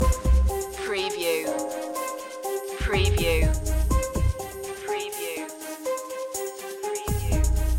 tension time loop

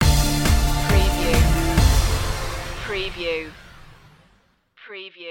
hands held high stinger

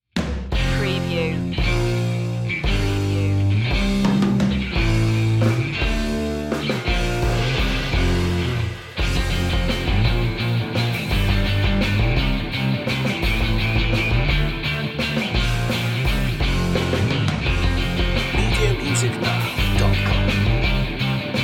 we wish you a merry christmas rock underscore

